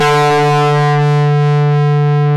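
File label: distortion guitar D2, GUITAR; AKAI S-Series CD-ROM Sound Library VOL-8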